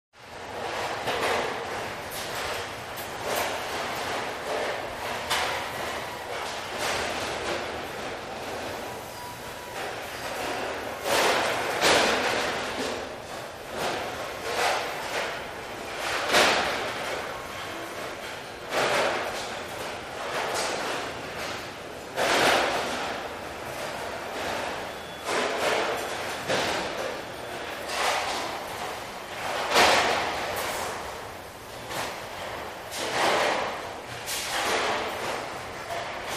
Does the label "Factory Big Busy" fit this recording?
Yes